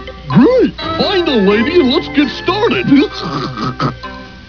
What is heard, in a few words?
rhino